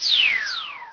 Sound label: Empty Recycle Bin; Wormageddon